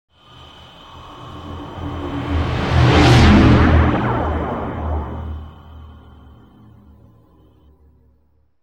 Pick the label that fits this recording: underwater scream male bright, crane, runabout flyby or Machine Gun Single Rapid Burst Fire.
runabout flyby